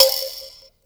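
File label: VIB KALIMBA B 5; Heart of Africa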